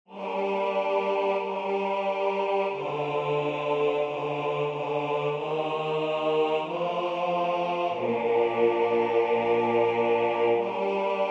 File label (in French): basse; Totus tuus